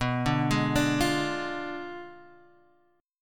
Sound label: B Diminished 7th B Guitar Half Step Down